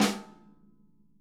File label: SNARE, Interactive Drum Kits